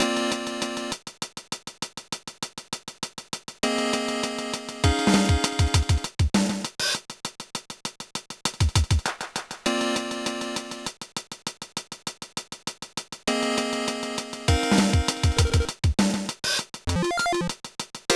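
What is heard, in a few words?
background music; No No No Puzzle Chailien; GBA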